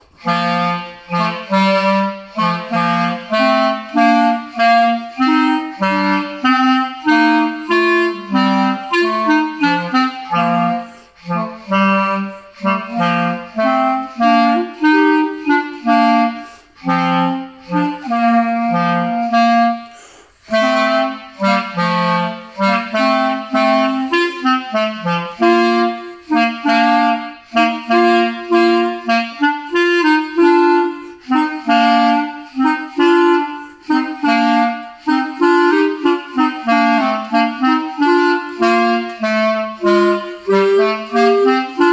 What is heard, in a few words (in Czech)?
dva klarinety